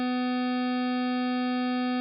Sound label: Original Periodic Signal